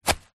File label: foley footstep single grass; zapsplat